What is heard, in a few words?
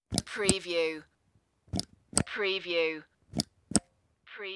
plastic button